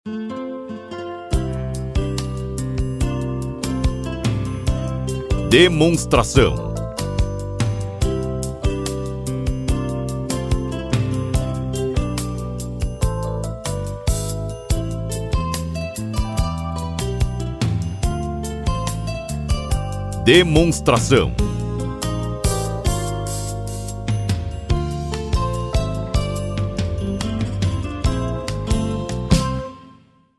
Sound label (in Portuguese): Trilha